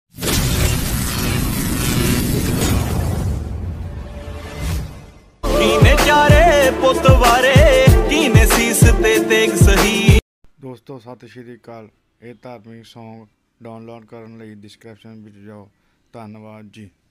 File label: punjabi dharmik song ringtone